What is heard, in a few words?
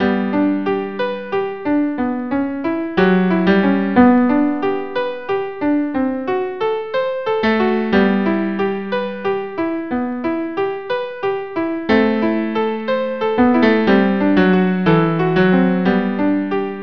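violin cello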